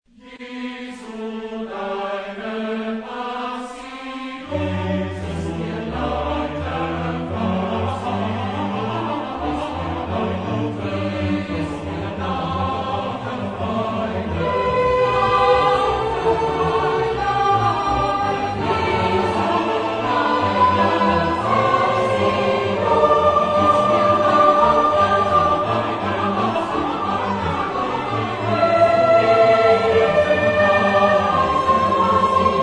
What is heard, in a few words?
Cantata, chorus; Bach; BWV182; Jesu deine Passion